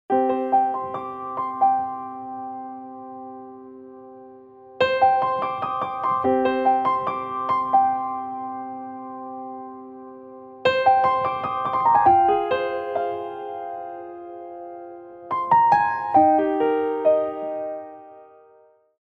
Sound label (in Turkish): santral fon muzikleri